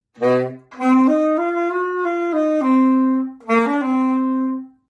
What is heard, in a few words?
sussy sax